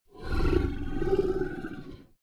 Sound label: monster choke large soft; koerpergeraeusche; comedy-cartoon; soundpool